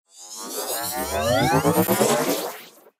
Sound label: Atom Charge